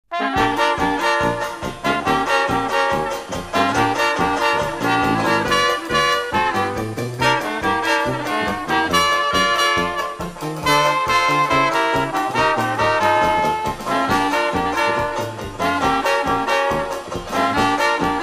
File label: swing